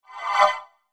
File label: WHOOSH VOX PAD, Whoosh Vox Pad Fast; Blastwave